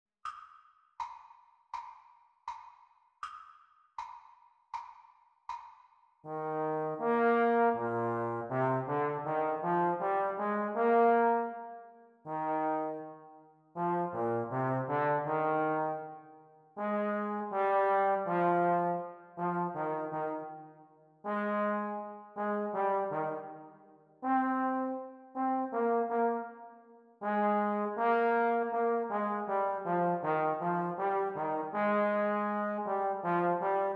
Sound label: Playalong for Eb Horn Practice Speed